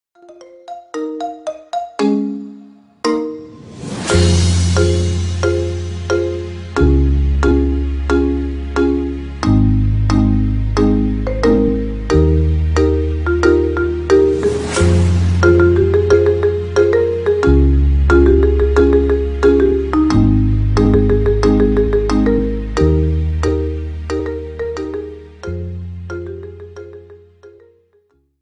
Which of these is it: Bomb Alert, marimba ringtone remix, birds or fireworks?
marimba ringtone remix